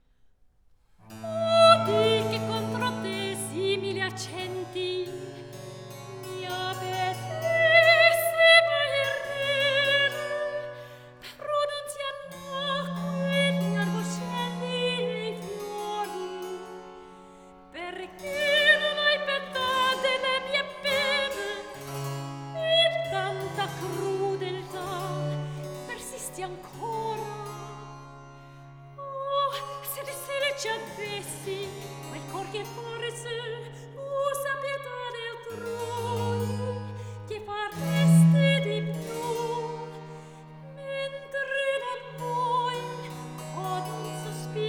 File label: Cantata, Recitativo; RV 664; Se ben vivono senz'alma; Vivaldi - Bellezza Crudel